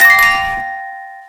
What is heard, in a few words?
trophy; se trophy